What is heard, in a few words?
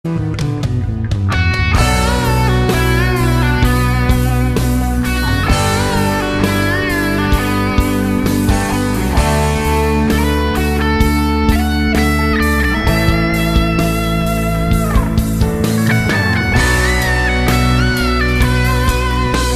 ringtone